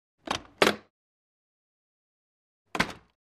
The freezer door of the refrigerator is opened, closed